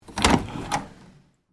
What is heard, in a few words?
Door Open Hollow, Foley, Door, Open, Hollow, Wood, Basement; Miscellaneous Doors; BlastWave